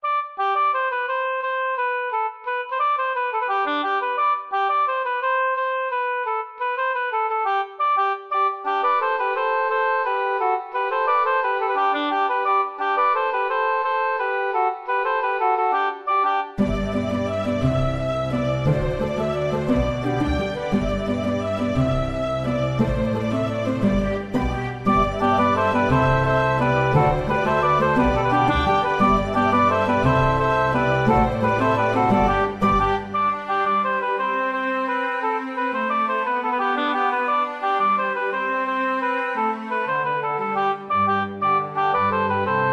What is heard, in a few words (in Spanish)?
Danza del bosque